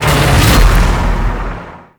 Void Dragon Chomp; Enemies; Abilities; Warframe; Duviri

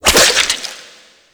Infested Lich Parasitic Link Impact; Warframe; Abilities; Zeke

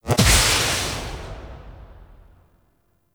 Impact; Grimoire

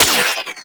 Shot, Weapons; Warframe; Tenno; Dual Soma; Prime Dual Soma

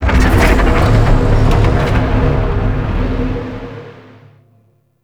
Void Dragon Move Start; Warframe; Duviri; Movement

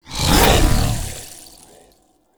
Dodge Cast; Warframe; Pacifist

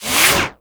Tenno Arsenal Sleep To Working, machines; Liset Machines

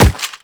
Run Rock, Footsteps

